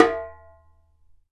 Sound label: Dumbeck; Digital Sound Factory